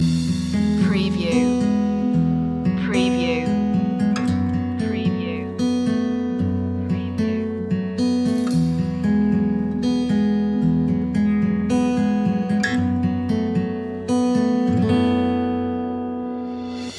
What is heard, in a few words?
easy summer loop